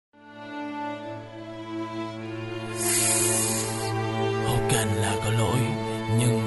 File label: rap; viet rap